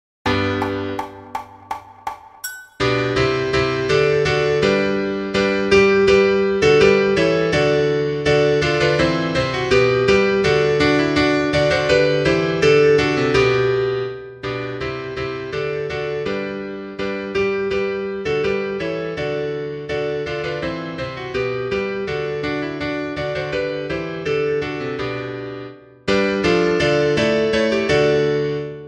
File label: Now Is The Month Of Maying practice accomp